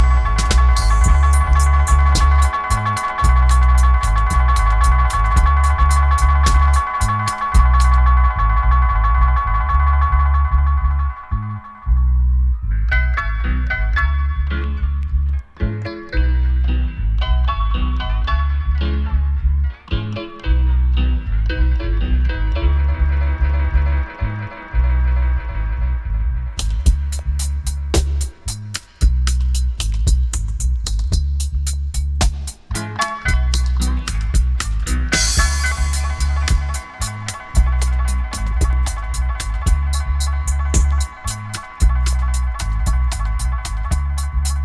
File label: dub